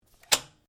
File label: Rifle Remmington 700 7.62 mm Dry Fire; Guns; A selection of domesttic firearms; Rifles